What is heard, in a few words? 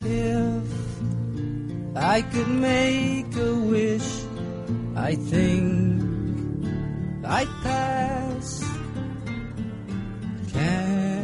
hammond